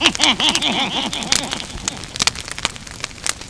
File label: Fire